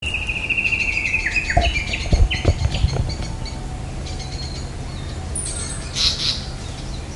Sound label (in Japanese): sankoutyou